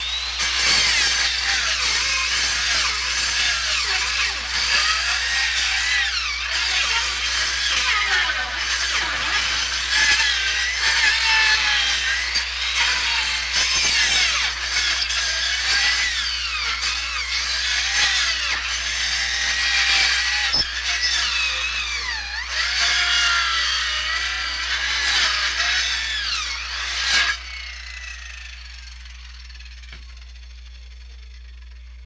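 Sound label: Circular Saw Bogging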